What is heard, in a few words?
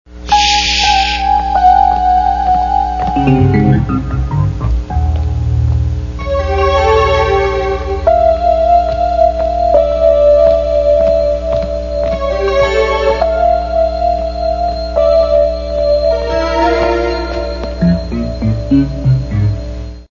rgb music